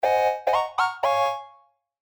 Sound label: notification fairy; お手伝い妖精; 通知音